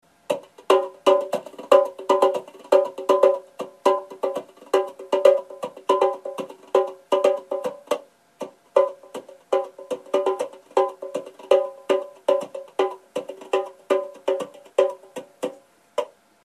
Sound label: djembe